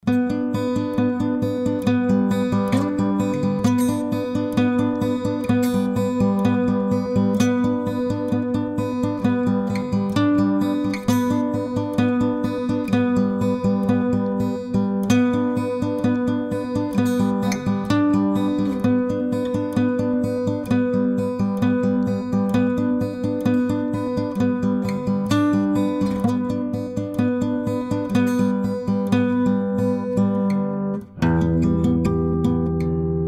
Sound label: BALLAD